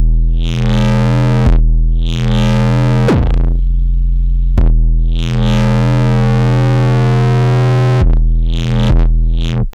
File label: pain cycle